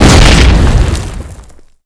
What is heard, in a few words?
grenade explosion